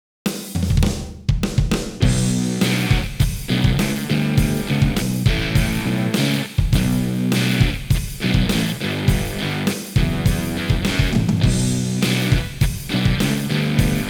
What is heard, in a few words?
Test A Console Rock ON